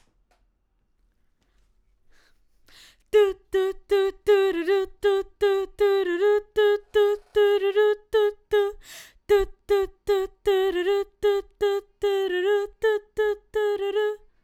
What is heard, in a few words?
BG Vox